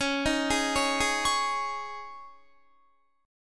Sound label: Db Suspended 2nd Guitar 4E,4A,5D,5G,5C,6F; arpeggiated; 21,18,18,18,20,20